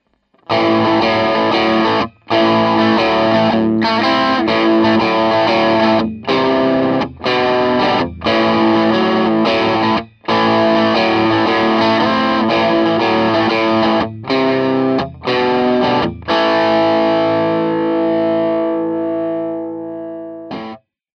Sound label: rock; Gitarre